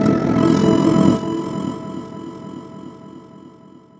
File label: Stereo Power Up; FREE SFX PACK VOL 3